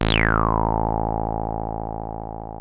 303 A1, TB 303, Synthesizer; Hollywood Edge; Giorgio Moroder Rare Synthesizer Collection

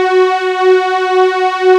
PAD WARM, Nord

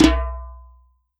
WORLD DRUMS MIXED DRUMS; World Instruments 2; PS MIXED DRUMS

